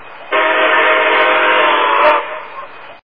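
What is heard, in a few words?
short sound of passing train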